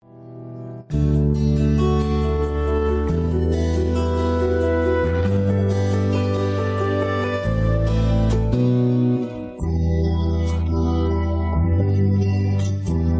Malayalam Music Album Vocal Removed HD

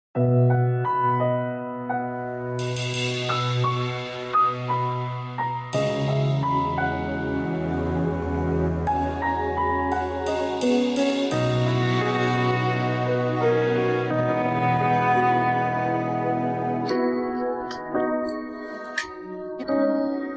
Vocal Removed HD; Mercury Art House; KKonnect Music

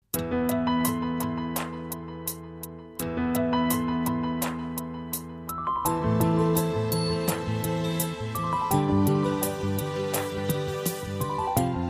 X'an Devotional Karaoke

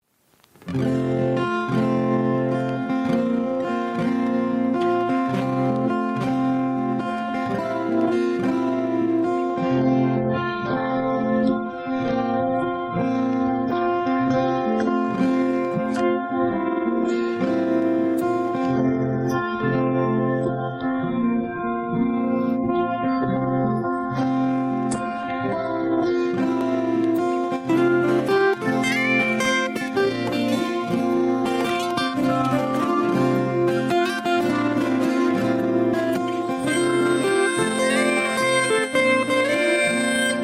Vocal Removed HD Karaoke Sample Track

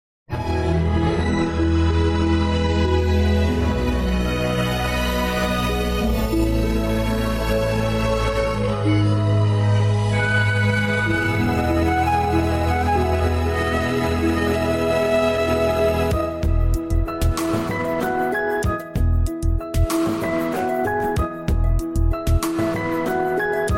Vocal Removed

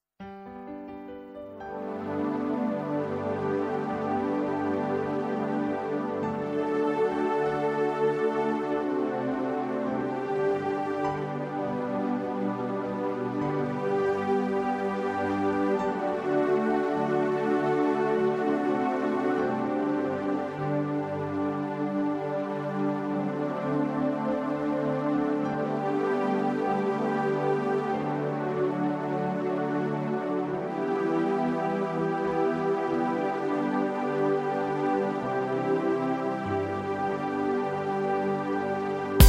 X'an Devotional Karaoke; christian devotional

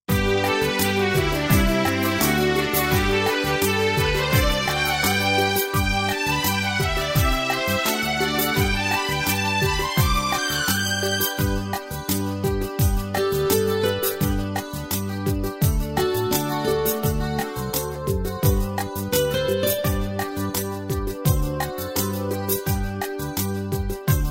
X'an Devotional Karaoke

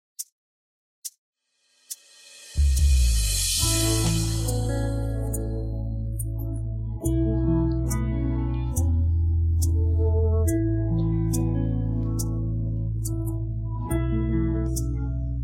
Vocal Removed Karaoke; M LAB CREATIONS

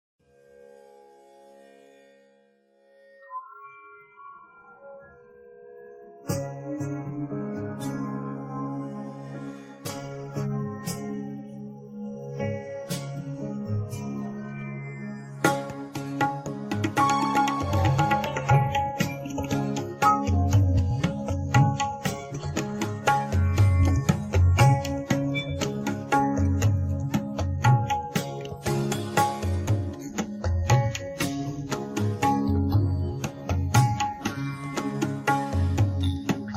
Vocal Removed

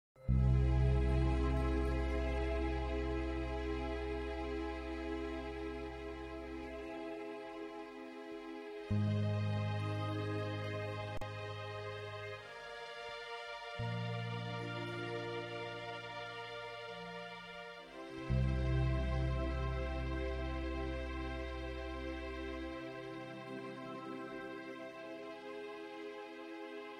Karaoke

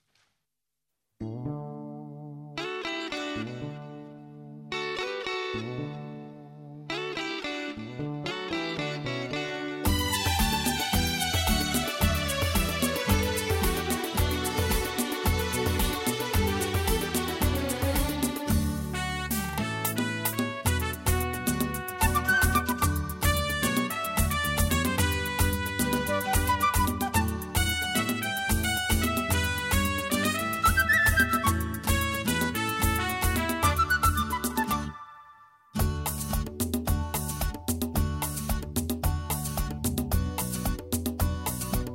Xian Devotional Karaoke

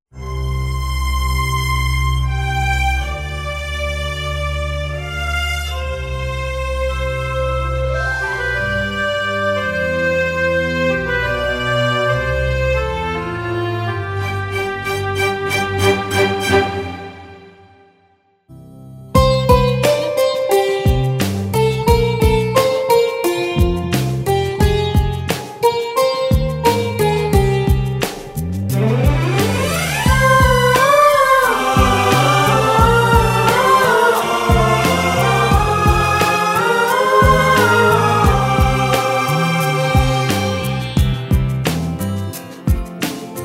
X'an Devotional KARAOKE

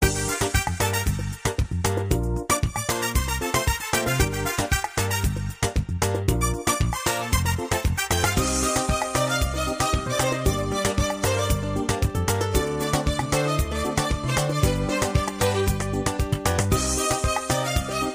X'an Devotional Karaoke; christian devotional

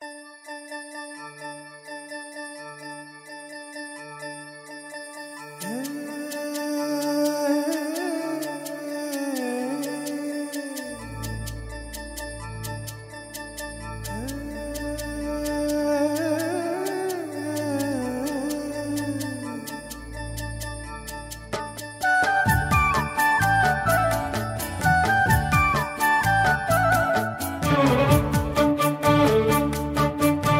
X'an Devotional Karaoke

